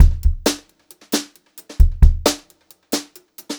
beat, Brushed Drum Loops; USB Soundscan vol.39; AKAI; 90 s Sample CDs; 133 HOTROD

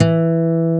GIT, Drum & Bass, 150 BPM; Ueberschall; Drum & Bass Flash Trackz

